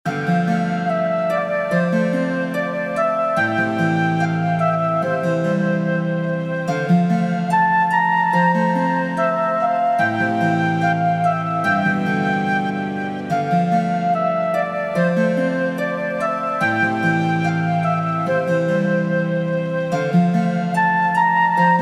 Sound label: Music Main; Royal Heroes; android